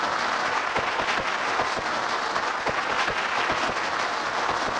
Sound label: Cracklature, sparse soundscape